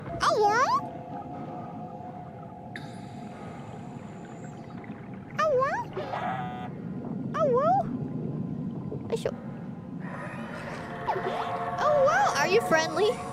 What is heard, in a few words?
awoan; noises